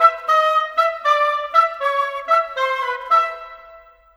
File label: Clarinet, Flute Oboe; Cinematic 04